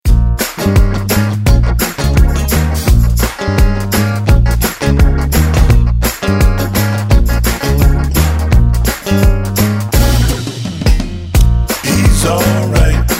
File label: Instrumental With Background